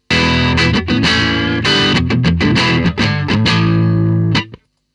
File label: Dave Hill Designs Europa SM 57 Amp Lo Z